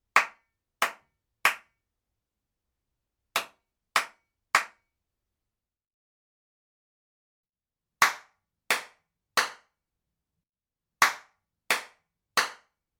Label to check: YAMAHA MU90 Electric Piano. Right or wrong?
Wrong